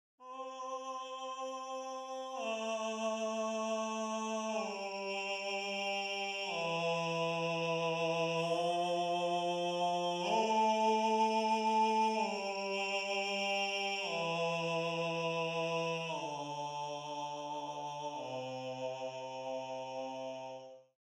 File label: Bass